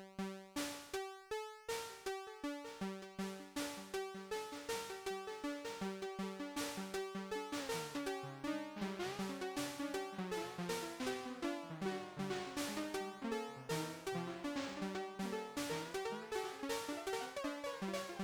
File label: manther delay c